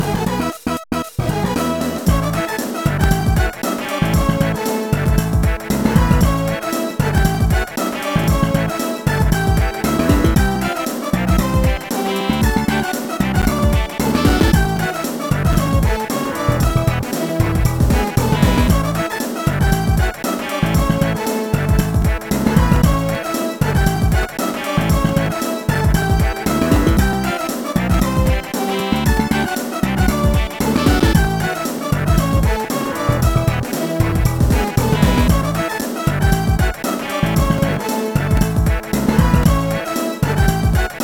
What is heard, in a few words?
Character Ending